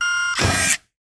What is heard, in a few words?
technology camera digital small switch off lens in; sound effects one